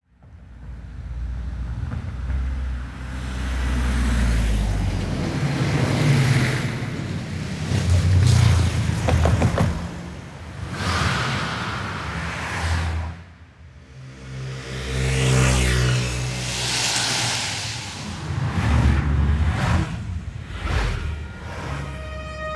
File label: Urban Passes By; Sampler; aXLsound